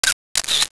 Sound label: camera